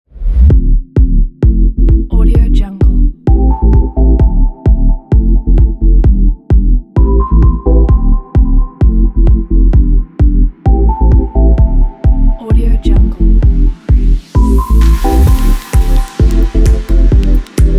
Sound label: abstract deep